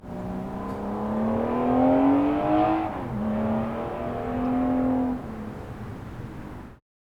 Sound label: Distant Car Rev Vehicles Land